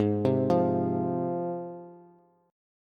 Ab 6th Ab, Bass; arpeggiated; JGuitar

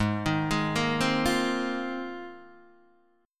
Ab 6th Add 9th Ab, Guitar, DADGAD

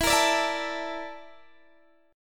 Bb Major 7th Flat 5th

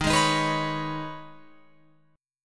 Eb 7th Suspended 4th Sharp 5th, Guitar, Open F; Eb7sus4#5; strummed

